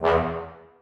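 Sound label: trombones staccato e2; Sonatina Symphonic Orchestra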